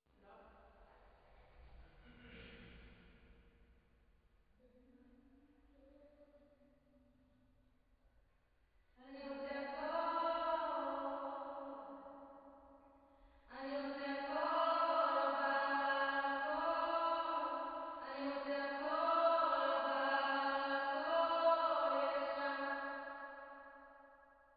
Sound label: Choir